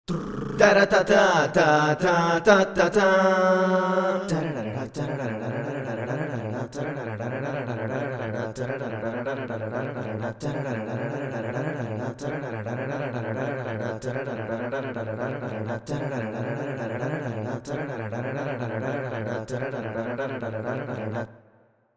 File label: A Capella Fanfare; Final Fantasy Inspired